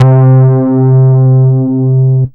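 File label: BASS OBMX, E7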